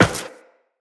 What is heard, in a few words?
Run Metal, Footsteps; Tenno